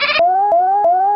mine tossed, roller; hl2

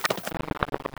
hop, crow; hl2

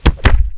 citizen punches, ambient voices

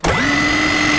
minigun wind up; weapons

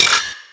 grind, manhack; hl2

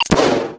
mine drop, attack helicopter; hl2

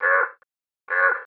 idle, crow; hl2

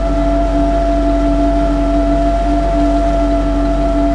generator ambience loop, canals; hl2

